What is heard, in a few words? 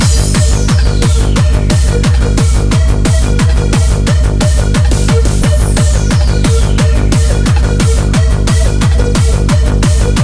common menu music (loop); itg